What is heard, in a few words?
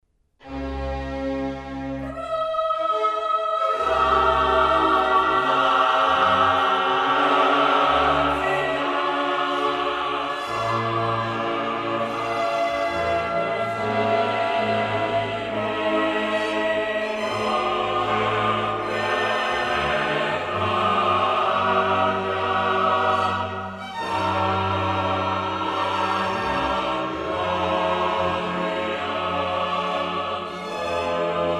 Mass in C min Gloria Gratias; Mozart; Missae and Requiem; Davis, Gardiner; Grosse Messe